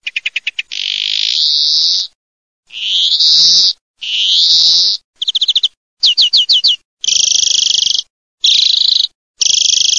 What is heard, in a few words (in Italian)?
verdone